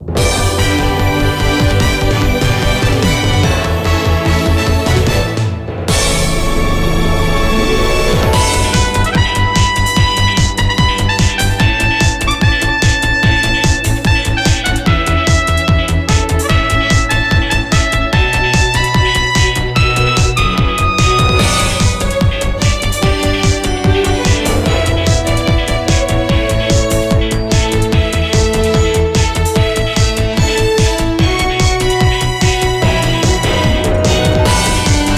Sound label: Jingle Mission Complete